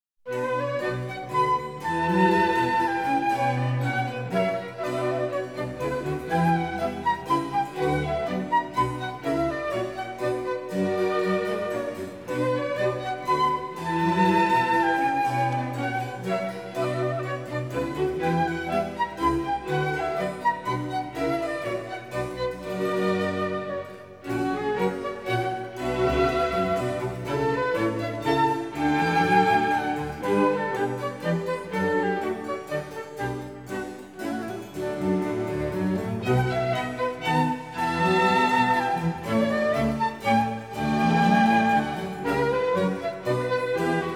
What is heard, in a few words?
Suite for Orchestra